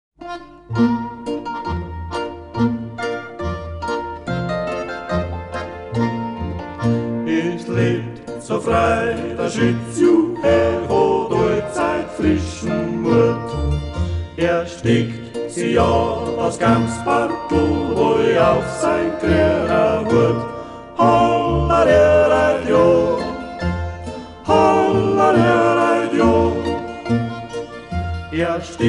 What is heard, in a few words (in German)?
Volksmusik